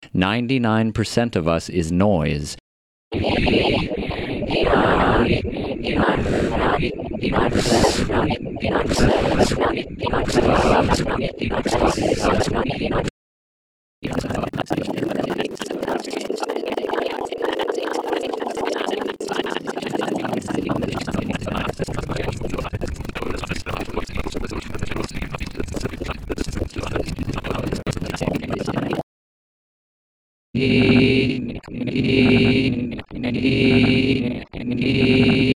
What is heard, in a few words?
Voice